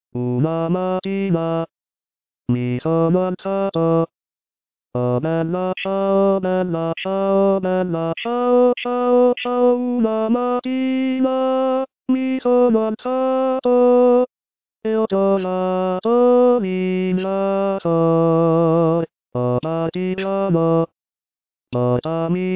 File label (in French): Tenor; Bella Ciao - Tenor 2